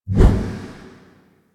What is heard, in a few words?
Void Projection Exit; Powers; Warframe; Focus; Operators; Wraith